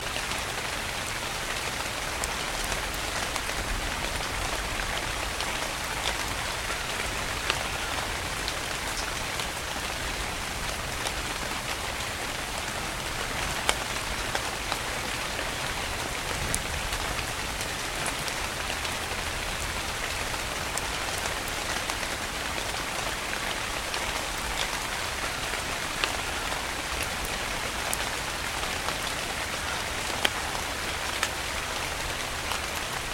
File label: rain